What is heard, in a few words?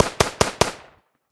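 machine gun sounds; zvuki avtomata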